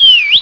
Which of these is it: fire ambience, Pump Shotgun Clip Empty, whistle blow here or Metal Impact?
whistle blow here